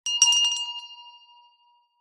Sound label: bell med small school hand ring short